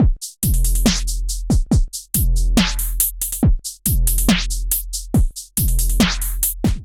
Kit groove 140 bpm, Drum; MIDI Clips; Moscow UHF Kit groove; Ableton; Factory Packs; Drum Essentials

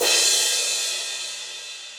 Crash 18 Big Punchy, Cymbal; Drums; Drum Hits; Standard Hits; Ableton; Factory Packs; Session Drums Club

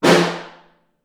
SN2 Far, Snare; Ableton Foundation; Snare Designer

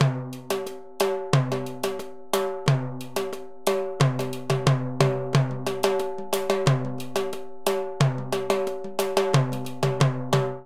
Murga B Timbales 90bpm; Latin Percussion; Ableton; Factory Packs

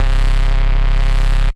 Vongo, Analog Bass; Ableton; Retro Synths; Analog Bass Vol 1

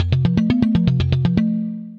Gamelan Chimes; Synth Rhythmic; Ableton; Factory Packs; Synth Essentials

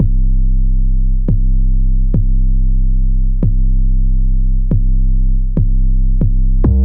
808 Bass C 70 bpm; Trap Drums; Beacon; Trap Drums by Sound Oracle; Ableton; Factory Packs

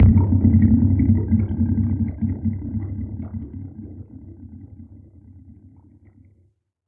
Bath Tub Bass, fleamarket percussion; Instruments; Ableton; Factory Packs; Lost and Found

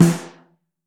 Snare Mono

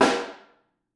Room, Snare

